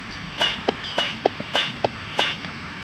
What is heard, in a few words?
heartbeat thump